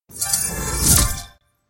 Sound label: Reload; Paladins